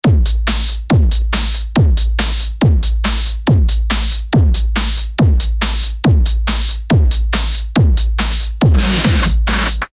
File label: hard trap drumbreak, drums